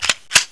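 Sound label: shotgun cock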